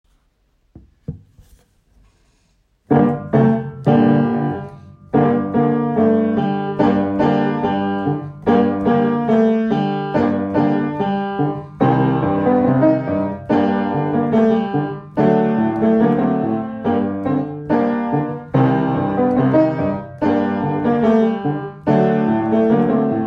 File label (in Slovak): hudba k variacii